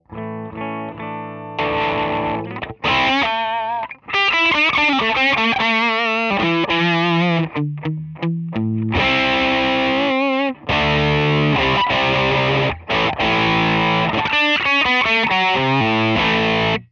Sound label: Bluesdrive Thin; GFS Effects